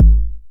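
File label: 909 BD tape, kick drum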